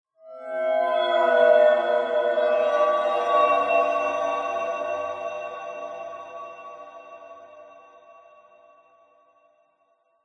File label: fantasy magic mysterious portal swell open with large reverb; zapsplat